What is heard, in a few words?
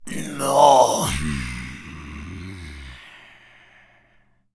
zombie carrier death